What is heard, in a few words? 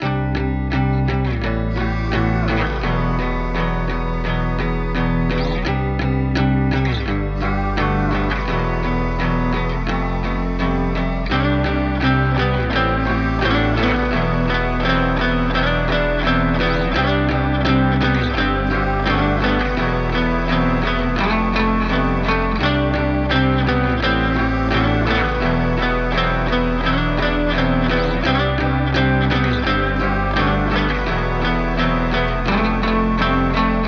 85 BPM C Min, Guitar; Rust; Cymatics; Miami Guitar Pack - Pt 2